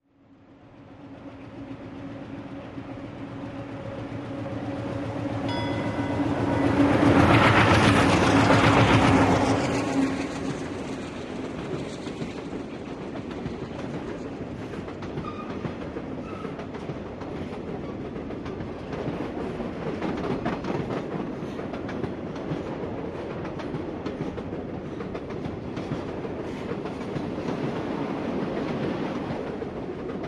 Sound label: Train, In Quickly with Bell Ding, Loud Close Up Engine By, Crossing Bells